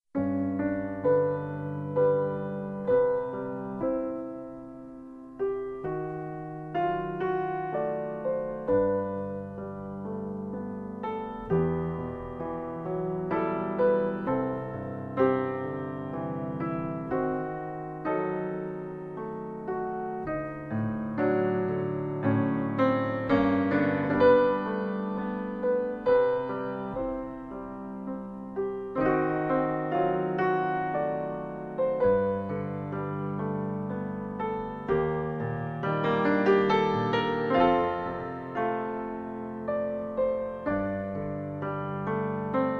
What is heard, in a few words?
Ragtime Waltz, piano